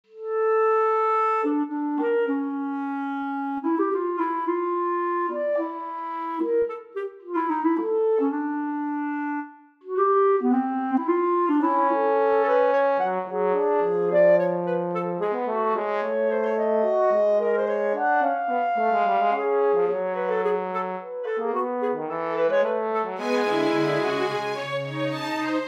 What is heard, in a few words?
Fugue